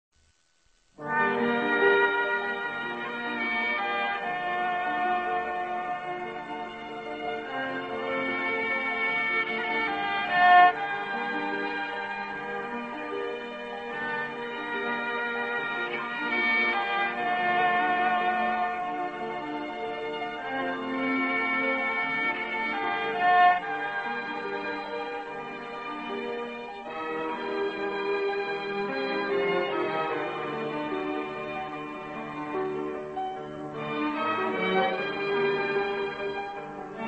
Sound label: Music